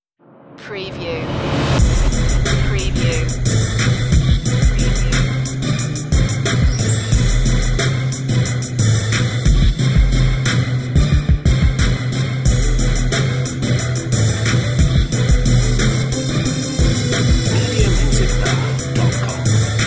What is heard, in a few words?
twang instrumental